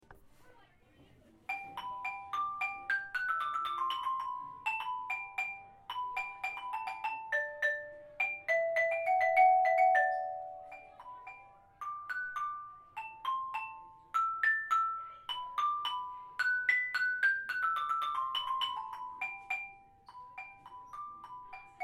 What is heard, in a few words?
mallet perc